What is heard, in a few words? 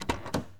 68 Road Runner Door Open, Automobiles